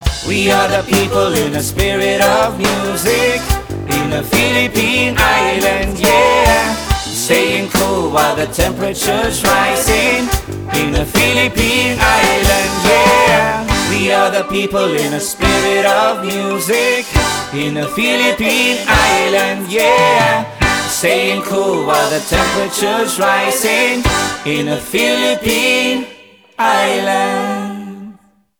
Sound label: Ringtone; Spirit of Music; Android